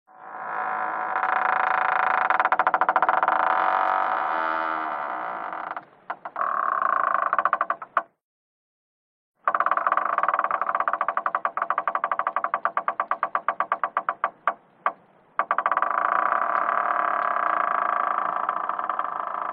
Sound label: House Door sound horror door opening door noises